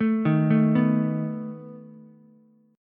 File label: A Suspended 2nd Flat 5th A down Ukulele; Asus2b5 arpeggiated